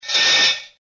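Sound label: sharpening an axe on a disc; точат на станке; топор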